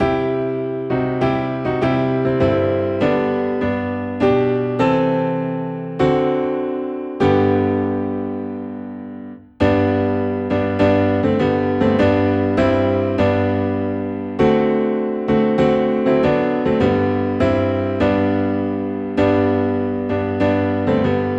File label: Piano Low in G, hymns; At Calvary